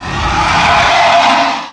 creature roar

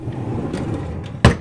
drawe close

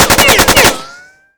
shots

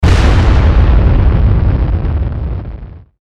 blast

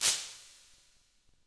subway

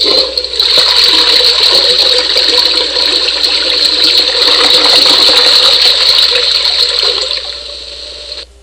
toilet

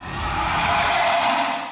dragon roar far

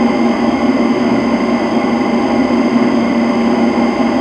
shuttle interior loop; trek

